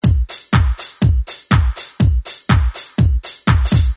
GARAGE, beats

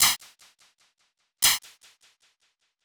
hihat

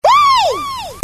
WOOAHH

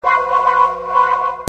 SPOOK WAH, GUITARS

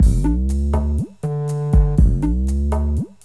tenor

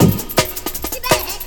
break

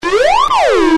siren synth

